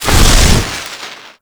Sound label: Flamethrower Stop; Lunar NY Ignis Skin; Weapons